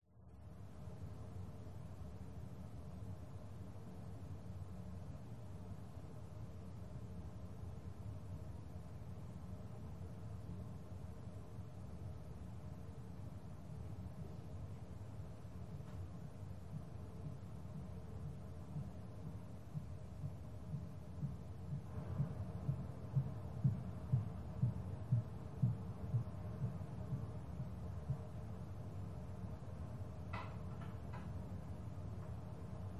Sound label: ambience amsterdam airport hotel room tone; ibis; pmsfx